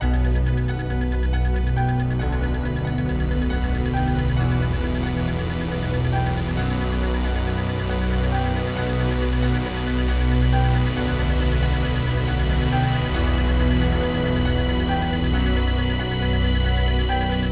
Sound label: Big Win Music Tally Loop; halloween; Mahi Gaming